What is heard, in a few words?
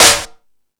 Discoball, drum machine